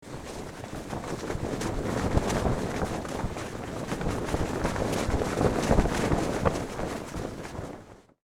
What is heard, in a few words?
Ship flag flapping flag pole no wind